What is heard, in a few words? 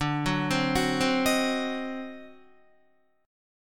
D 7th Flat 9th D down Guitar; D7b9 arpeggiated